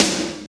SNR, Tekno Industrial; East West

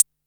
XV Perc, Roland XV-5080

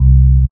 Elc Bass; Equipped Music

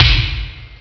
mt32 bass drum, Amiga Soundtracker Sample Packs

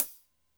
Cym Ambient, Drum Machines Alesis D4

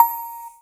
pop; Sonic Foundry (Sony Creative Software); Synchro Funk; One Shot Sonics

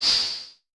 HH, Vermona Mono15 Drums

